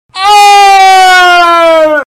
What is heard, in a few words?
screaming; sans